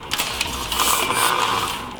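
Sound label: 120 BPM; RHYTHMLOOPS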